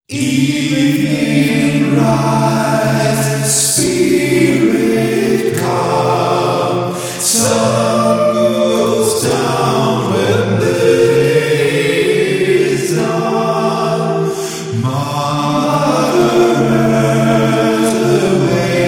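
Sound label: sop2, soprano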